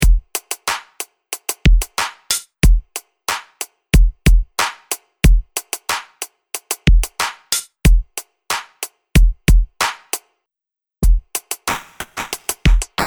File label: Drum Loop 3x Synchronus